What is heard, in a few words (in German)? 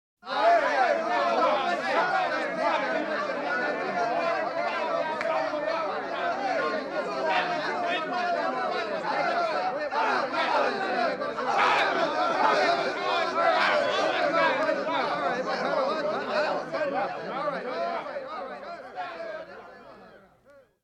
verärgerter pöbel (wenige); Großstadtgeraeusche